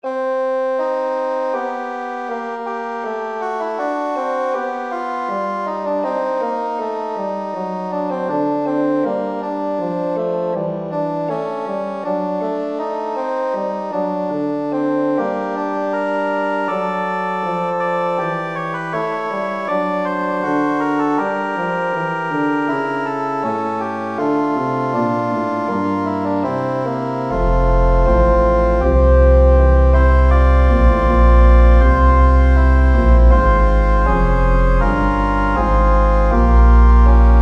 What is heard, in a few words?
Preludium c